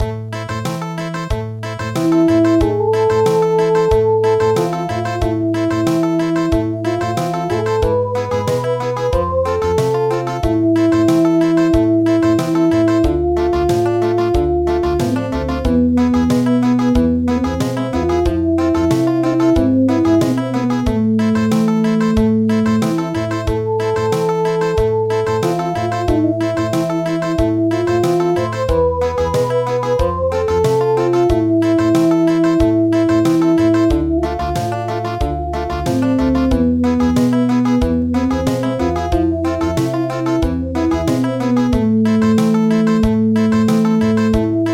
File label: Country; Bubuy bulan